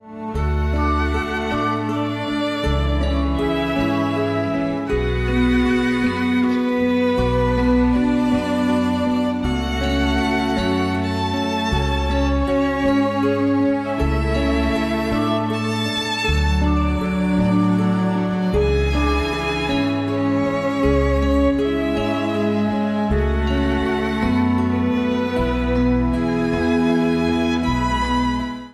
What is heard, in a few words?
ringtone